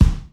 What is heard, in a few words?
tight steel kick drum c sharp key; Mono; kick drum samples